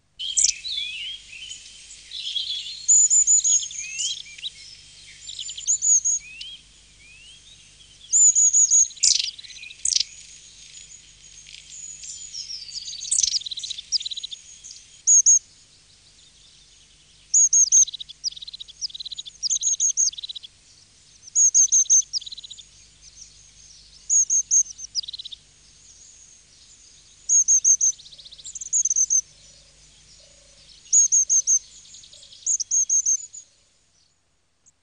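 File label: Long-tailed tit; Madarak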